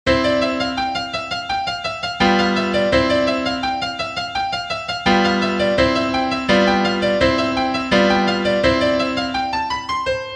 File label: Czerny Etude; 50 etudes edited by Germer